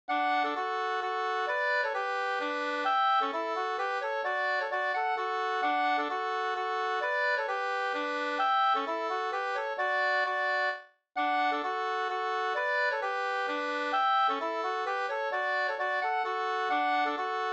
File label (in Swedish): hambopolska, 2st